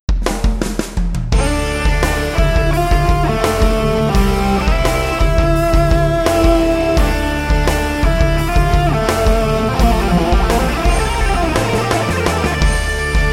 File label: SHRED ROCK SOLO